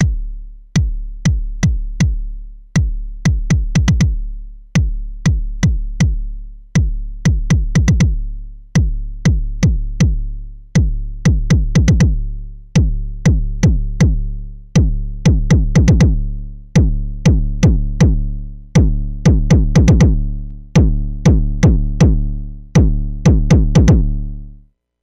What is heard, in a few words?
harmonics; mbase11